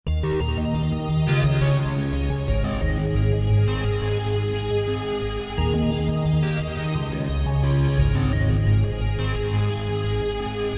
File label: techno